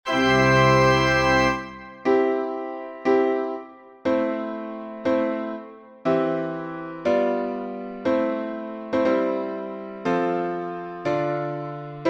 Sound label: sanctus satb; gounod; Messe brève No. 7; 3. Sanctus